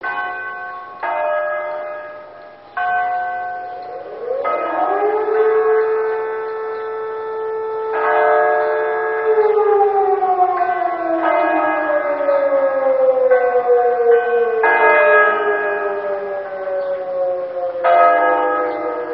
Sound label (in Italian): sirena; racconigi